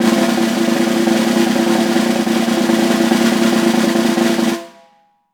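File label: snare roll mezzo forte; Best Service; Peter Siedlaczek's Advanced Orchestra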